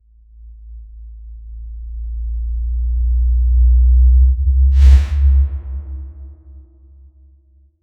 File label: riser bass with big impac